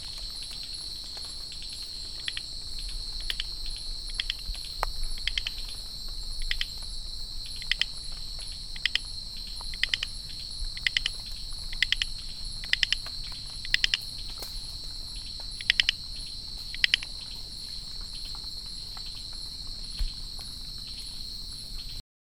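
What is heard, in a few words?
microhyla mantheyi, Manthey's Chorus Frog call; Singapore.; Recorded after rain in freshwa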